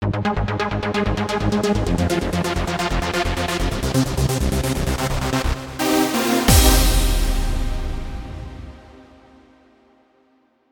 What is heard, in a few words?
Jingle Arpeggio